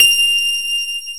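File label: grand piano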